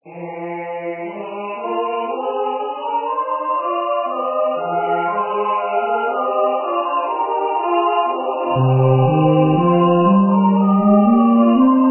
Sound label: Rorate Caeli Eb Bass